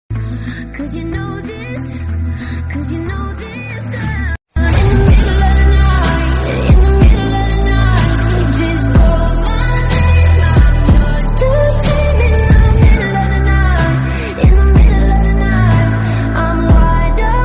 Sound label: lofi english